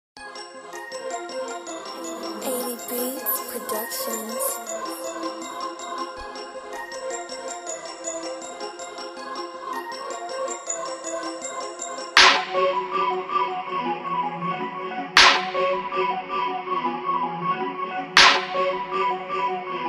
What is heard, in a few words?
Instrumental